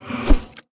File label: Dead Monk Fast Attack; Blind Quest - The Frost Demon